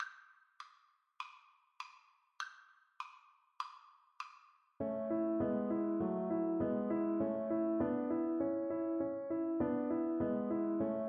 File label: humming song violin; schumann